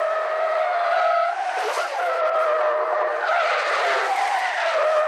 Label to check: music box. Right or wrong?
Wrong